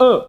uh

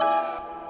musical ow, stabs

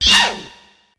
shew; ooh ahh

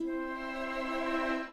String

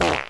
fart short

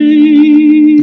kond, Voice

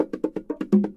jembe, drum loops

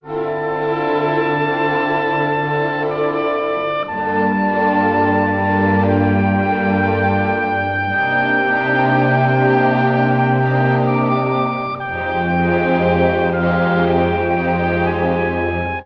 strings, Sad

